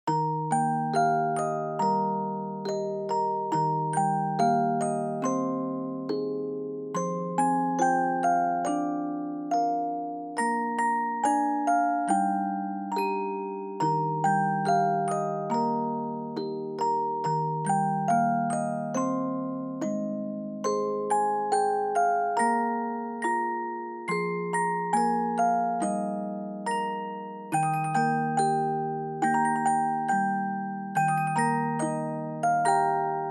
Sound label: Dry Slow; bgm; Jingle Bells